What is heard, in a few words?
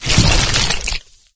carrier walk; flood